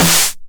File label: 909 SD Tape Sat